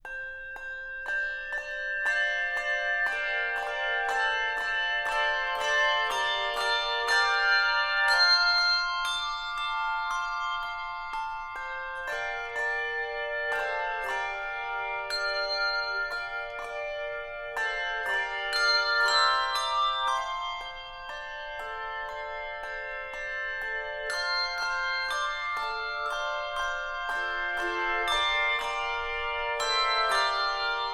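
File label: Handbells; Lorenz Corporation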